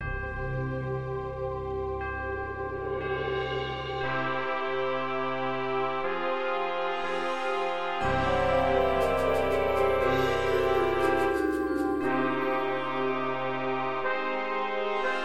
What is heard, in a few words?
easy perc synth